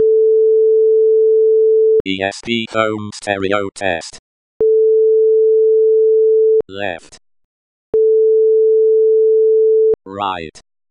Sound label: stereo test